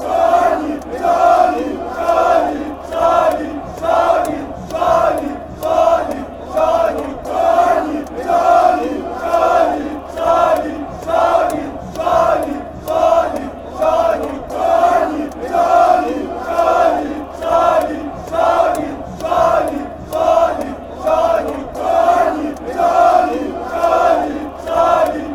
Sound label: hungary chant